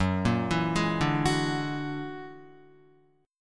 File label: Gb Augmented 9th Gb down Guitar 4F,4A,5C,5G,5C,6E; Gb+9 arpeggiated